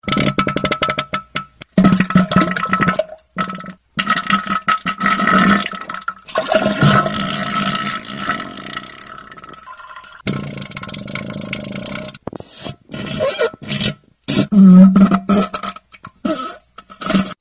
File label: Diarrhea; gmod; darkrp; soundboard